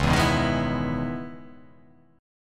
C sharp Minor 9th C sharp, chord

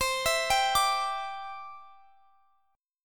E Minor 7th Sharp 5th C

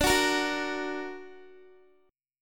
D Augmented D down Guitar; D+ strummed; tuning 4E,4A,5D,5G,5C,6F

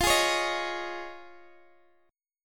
F Minor 7th Sharp 5th, Guitar, DADGAD

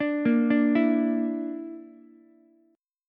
D Suspended 2nd Sharp 5th D Ukulele; arpeggiated

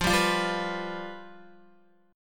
F Suspended 2nd Flat 5th, Guitar; strummed; x,8,7,10,7,7; JGuitar

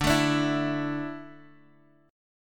D Suspended 2nd D down, chord; strummed

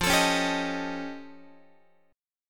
F Augmented 9th F Guitar; F+9 strummed; x,13,12,11,11,14; F+9 Chord; JGuitar

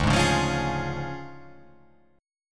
D Minor Major 7th Flat 5th, D

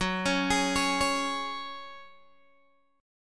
F sharp Suspended 2nd, Guitar, Open G; x,11,11,13,14,11; down

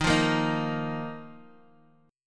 Eb Suspended 2nd down; Ebsus2 strummed; JGuitar

